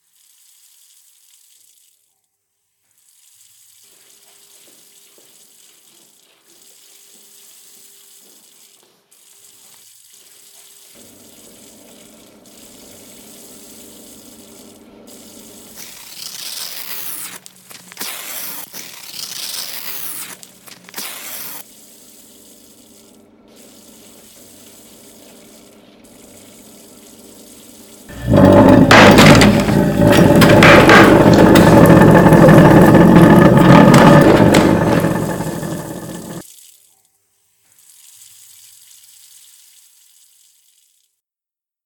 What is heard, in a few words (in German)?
Gewitter